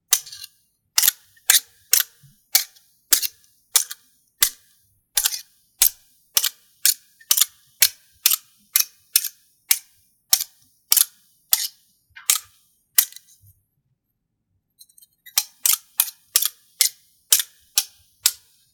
Swords

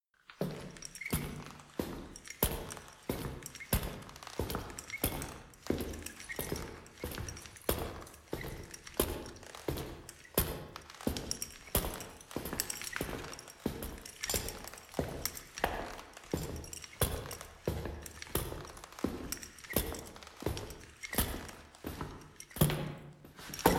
Footsteps Knight Team Walk Run Castle Hall

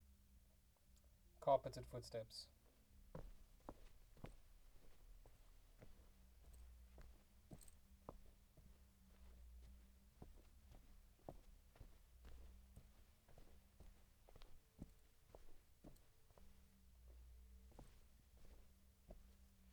Footsteps Boots Carpet

